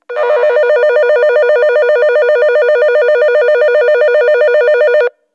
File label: departure bell; minami; ito 2ban